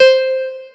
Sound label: piano